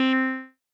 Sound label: bink; Jumpy